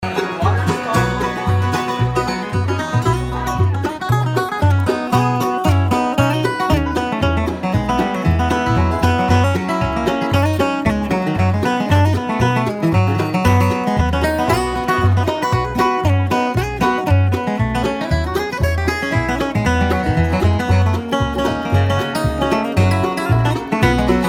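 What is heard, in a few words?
guitar solo; banjo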